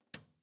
Schalter

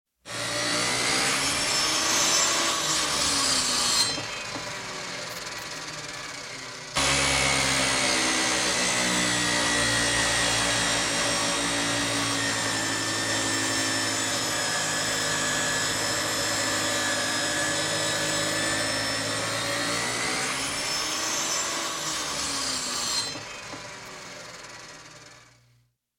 Hausarbeiten Heimwerken Elektrische Saege

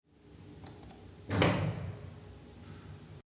Industriefahrstuhl

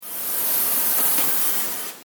Messer schneidet Papier

